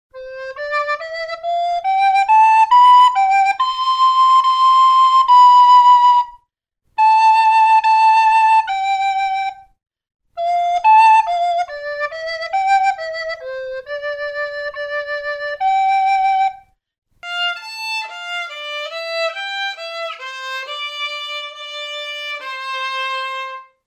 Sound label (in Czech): Sopran Fletna Housle C2